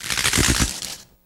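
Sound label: bone crack; E-MU Producer Series Vol. 3 – Hollywood Sound Effects; Science Fiction; Pumpkin Head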